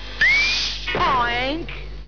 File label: boing